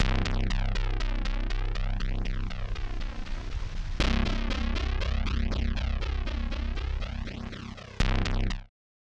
rhythmic menu set; heavyocity aeon

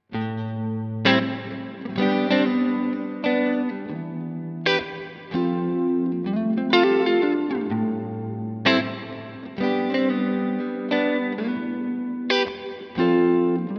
uafx golden reverberator

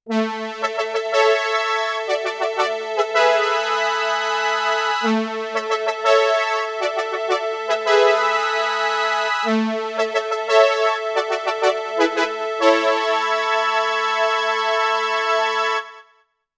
modal electronics cobalt5s

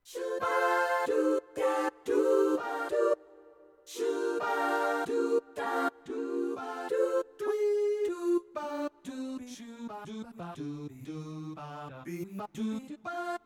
yamaha tyros 5 voices scat vocalists

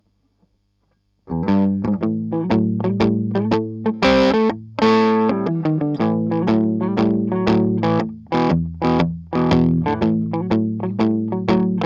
germanium drive smooth highs full boost; Chandler Limited

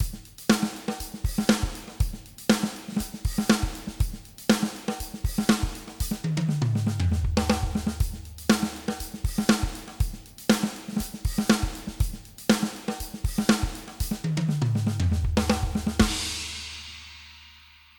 drums; softube abbey road studios brilliance pack

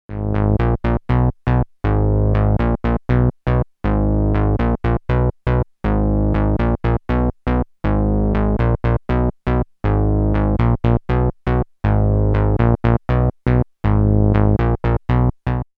korg minilogue bass

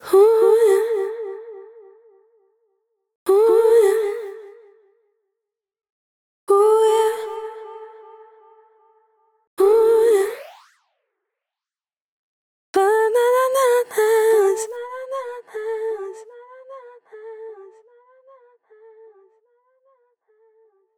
stompdelay; Delay-Plugins; psp

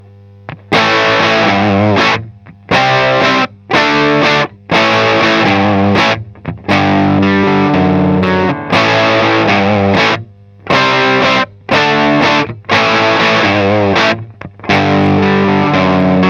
thc sunset 212; THC Sunset Combo 212

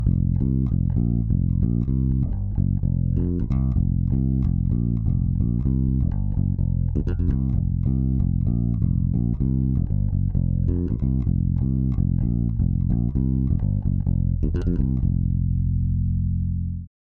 bass distressor; uad empirical labs distressor test

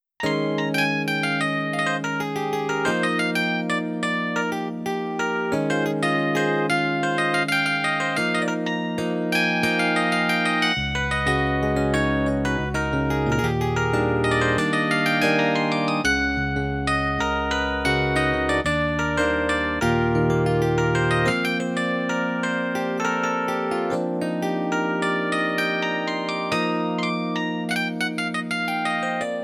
digital e piano; Casio PX-S3100

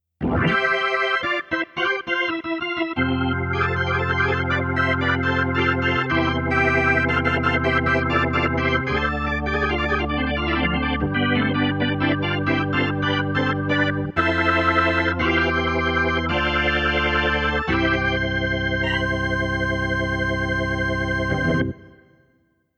organ; Casio PX-S3100

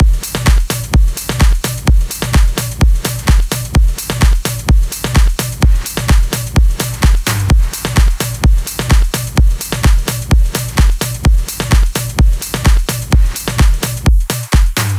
drum loop wet; Drums wet; JMG Sound Mirror

